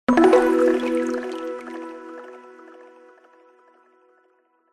multimedia game sound calm soft mellow watery positive